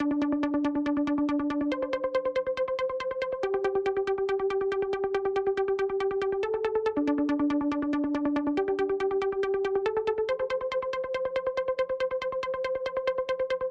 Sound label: Mariachi Inst